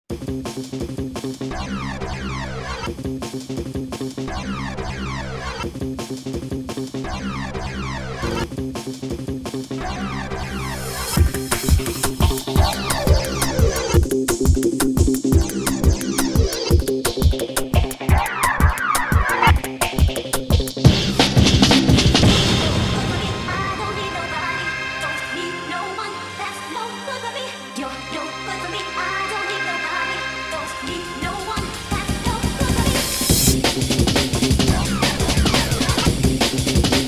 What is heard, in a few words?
drum n bass remix